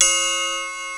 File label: TRIANGLE; East West; Global Sounds